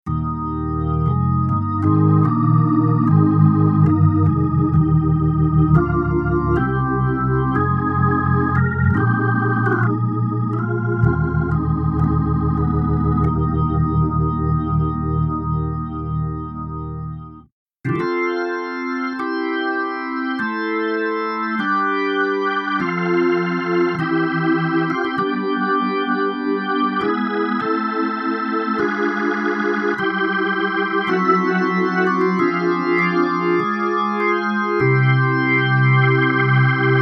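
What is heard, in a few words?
Kronos Solo Ballad, organ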